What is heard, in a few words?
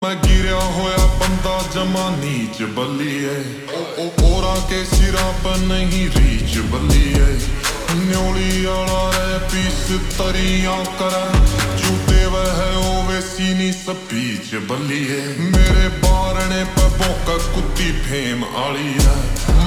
Ringtone Slowed Reverb